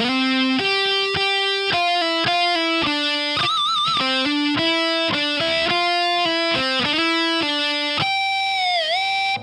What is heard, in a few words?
line6 pod xt; j-800